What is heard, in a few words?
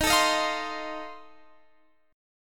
F 7th D sharp